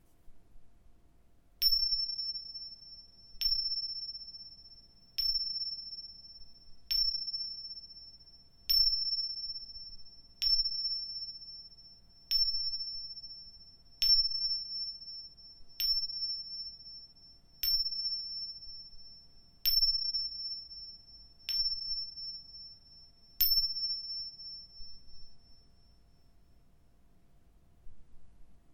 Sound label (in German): Zimbel